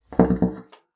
BASSOON; Xsample VOL-6

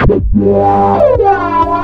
130 FRESH; USB Soundscan vol.20; Fresh Disco House I; AKAI; DISCO ADD

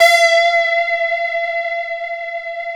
Korg PS3100 G 3; Back In Time Records; Korg Universe VOL-1

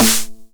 909 SD Tape Sat; Goldbaby Tape808 & Tape909; The Tape 909

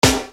Snare Dr

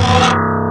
SCRAPE GUT C2

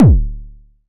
Analog Kick; Roland BK-5

